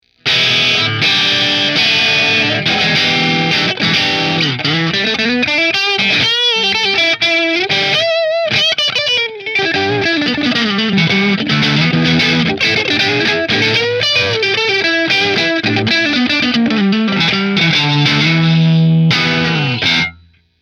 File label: Middle Marshall; Nola Quilt